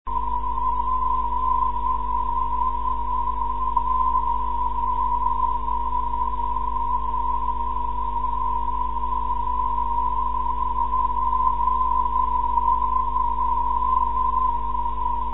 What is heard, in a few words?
328khz; cyp lbn syr ndb; pha